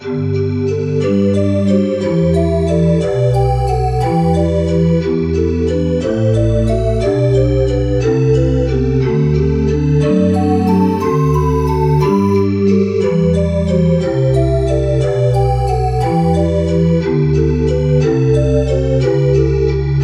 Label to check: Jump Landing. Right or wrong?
Wrong